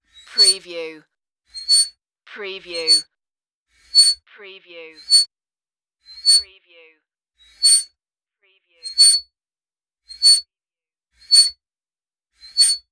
squeaky hinge

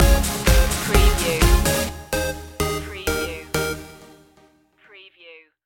starship dance stinger

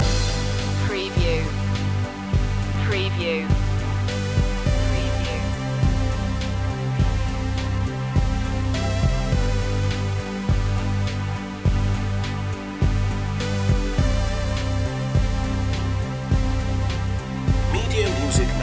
deep dreaming loop

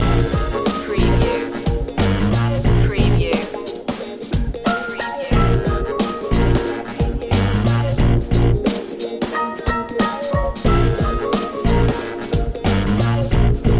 smooth jazz funk loop

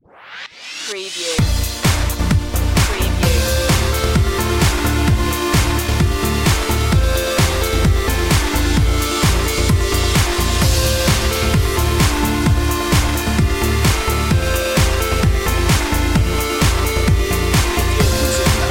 laserbeam

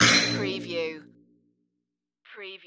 menu error notification

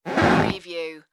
creaky floor

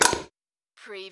Button Sound Metal Can Crunch

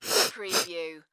Sniff Twice Female; sound effects bible

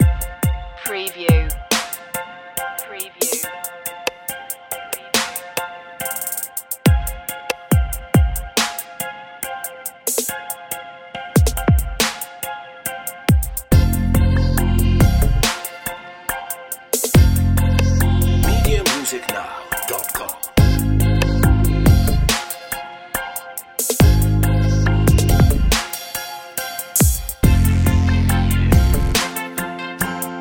your own trap

